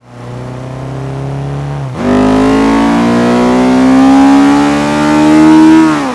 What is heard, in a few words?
no shift, vehicles; civic97